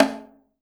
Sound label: PERC, 180 BPM; Ueberschall; Drum & Bass Resonance